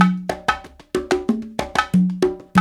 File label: World Dance; Spectrasonics - Supreme Beats